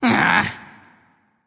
"ugh"; smite; voicepack; sylvanus

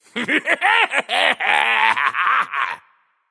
nv yay; smite; voicepack; xbalanque

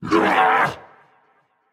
grunt attack; smite; guan yu

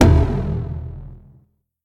Fire; smite; raijin

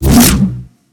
swing large; smite; god arthur

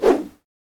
minion swing big; smite; npc; roman archer

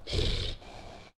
victory snore; Smite; Cerberus

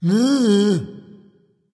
tongue, voice; smite; voicepack; ymir

